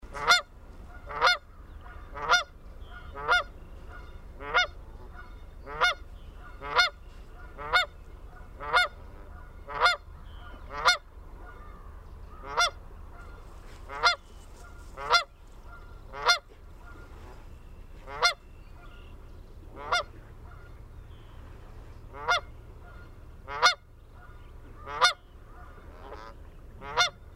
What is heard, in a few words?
Canada Goose Family